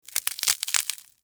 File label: Crunch Pretzel Stick